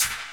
KIT, Dance Mega Drums, HIP; Best Service

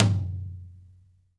TOM, Percussion; MARKYDRUMS

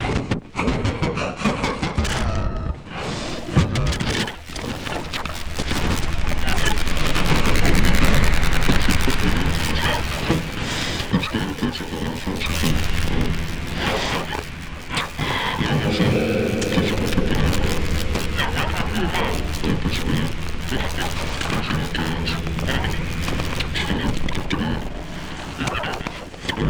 Powerstation Pandemonium; Zero-G - Ghost In The Machine

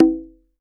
CONGA HI OP

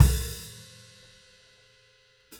HIT; PlatinumPhattCD1; E-MU Producer Series Vol. 8 – Platinum Phatt; Grooves Filz Hitz

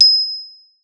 GLO D#6, GLOCKENSP; Xsample VOL-4

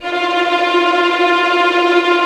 TREM F4, Violin; Q Up Arts - Denny Jaeger Master Violin Library; PERFORMANCE

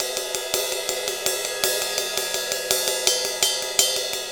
Ride Cymbal Pattern

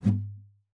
GUITAR FX; Xsample VOL-2

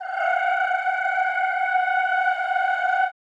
CHO F#5, Rrrr; Sound & Vision; Gigapack I CD 2; CHO Mmhh + Rrrr

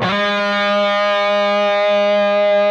PRS A, Guitars, LEAD; Spectrasonics; Hans Zimmer Guitars VOL-1

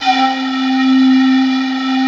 FLUTE R, Drum & Bass 160 BPM; Ueberschall; Drum & Bass Flash Trackz

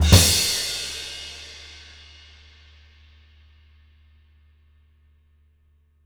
BIG END, Living Drums, JZ CRASHES; Sampleheads; Peter Erskine

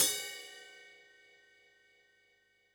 BELL, Yamaha DD-65 CYMB

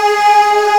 G#4 PULS; Northstar; The Wizard VOL-1; ORCHESPHERE 2

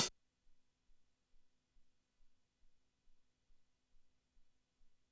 HH close, ELKA X1000

